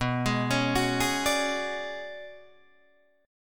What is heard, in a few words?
B Minor 6th Add 9th B, Guitar Open D